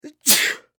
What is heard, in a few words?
Human Male Sneeze; Sneezing